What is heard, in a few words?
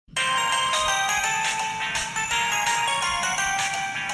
Simple Ringtone